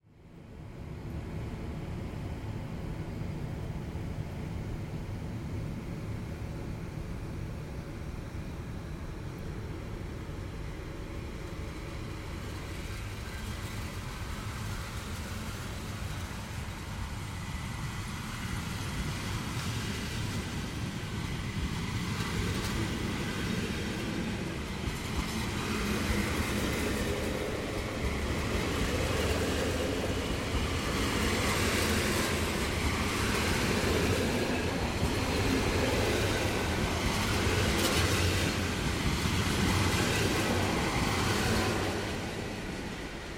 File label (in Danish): Tog elektrisk koerer; KØRETØJER